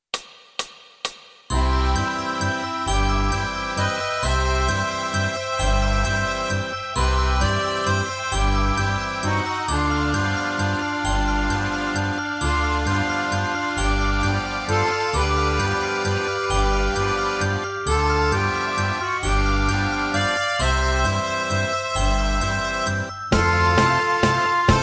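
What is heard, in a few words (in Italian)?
Fisarmonica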